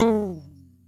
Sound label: pluck; crowds; NotDotTeam